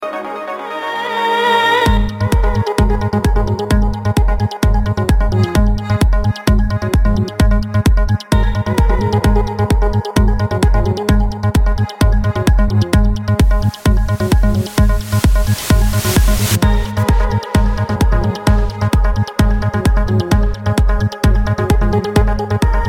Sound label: Carnatic Remix